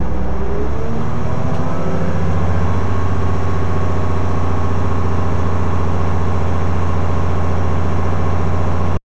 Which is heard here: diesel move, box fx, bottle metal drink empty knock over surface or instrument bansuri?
diesel move